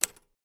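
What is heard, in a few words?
Typewriter Single Key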